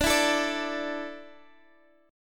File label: D Suspended 2nd D down, chord; Dsus2; strummed